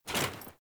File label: Foley Action Armour Jump; Characters; Player; Deora Armour